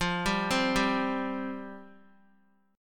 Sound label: F Suspended 2nd F Guitar; Fsus2; arpeggiated; x,8,7,5,7,x